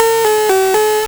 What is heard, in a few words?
Beat